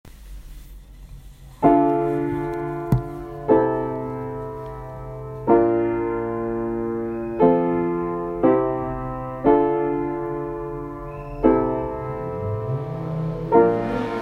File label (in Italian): bassi; shema